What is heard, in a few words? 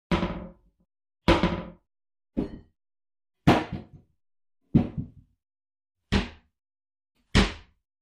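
Kitchen Cupboards Open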